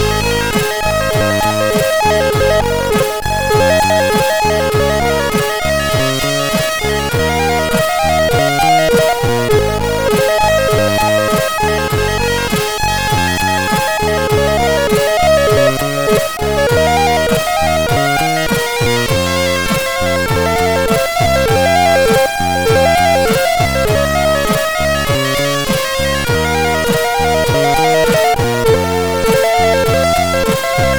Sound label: Delta Slow, MOS6581R4; hvsc